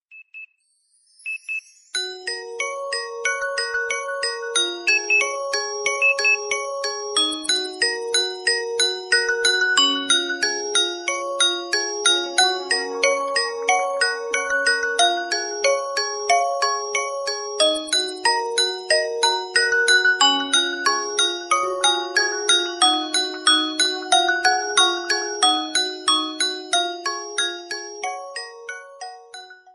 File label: Alarm Ringtone